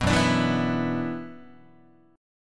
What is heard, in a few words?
Gb 7th Suspended 4th Sharp 5th Gb Guitar; strummed; JGuitar